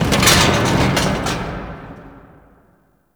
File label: Metal Glass Rattle; Ambience; Props; Warframe; Entrati